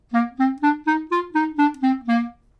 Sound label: resonant